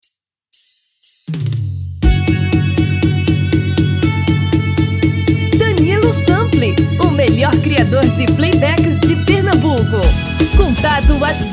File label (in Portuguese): dois tom acima